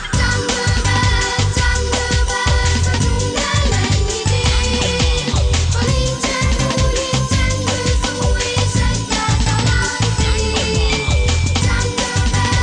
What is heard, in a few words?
junglebell